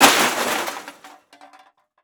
Crash Metal; Crashes

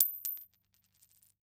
Coin Nickel Dropping On Cement; Foley

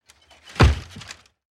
Door Bang Screen Door Wooden; household

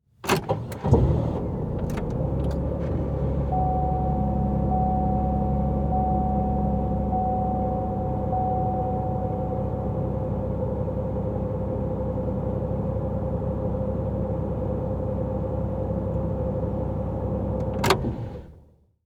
Car Monte Carlo Start Idle Off Interior; transportation

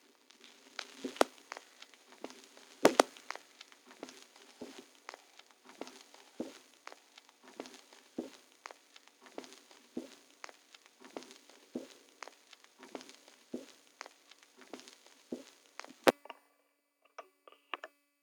needle end, Vinyl; Cineblur

